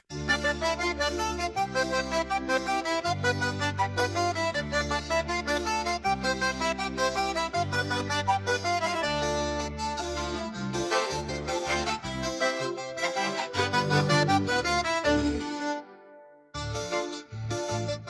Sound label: Synth